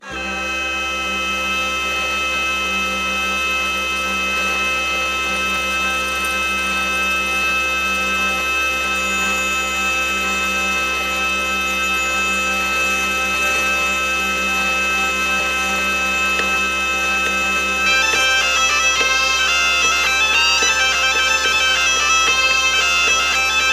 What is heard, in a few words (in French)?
bourree A Chabrier Bourree A Tournadre Bourree De Tremou; bourree3temps